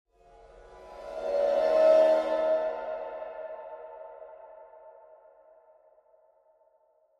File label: Horror Cymbal Swell Soft; Scary Tones; Blastwave; Sound Effects:Horror